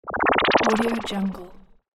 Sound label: magical warp